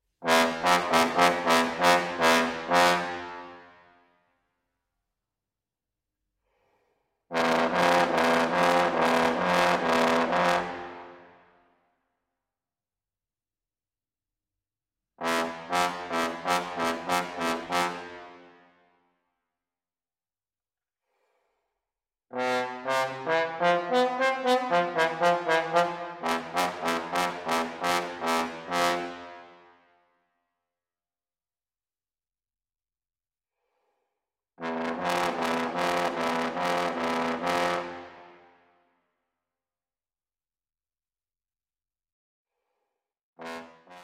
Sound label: Trombone